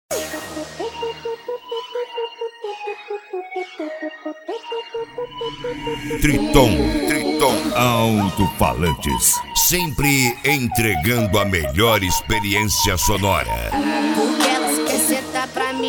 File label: mega funk